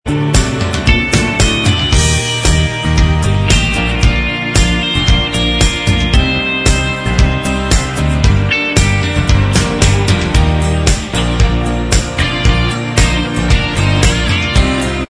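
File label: instrumental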